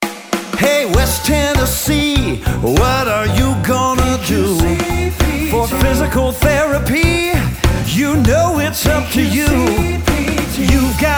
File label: jingle